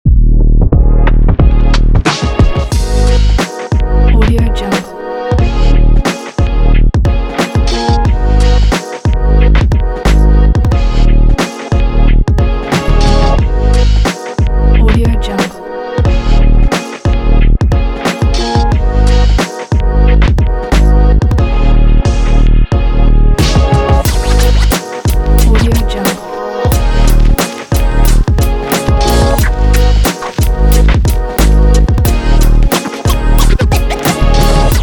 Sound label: vlog